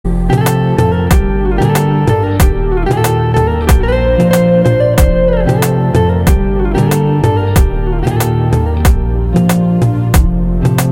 Very Very Sad LOVE BGM

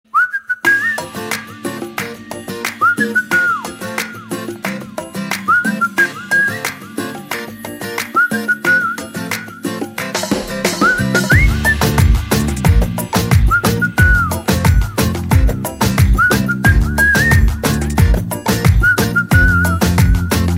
Whistle Bgm

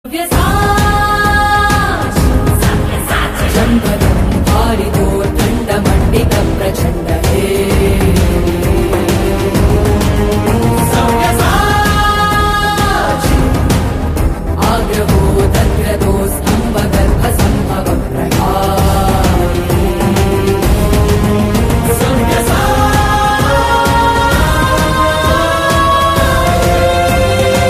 Female Ringtone; Savyasachi

